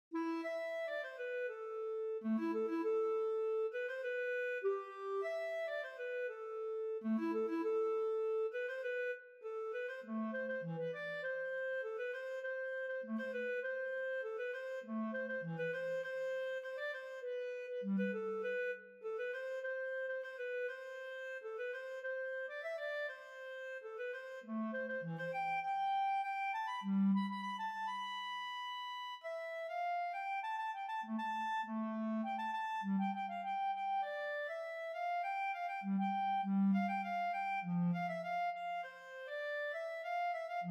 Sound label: Clarinet Bb